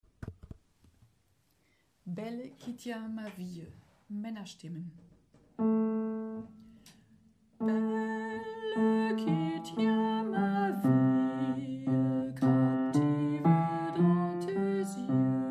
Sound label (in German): Bass, Chor; Belle, qui tiens ma vie; chor ag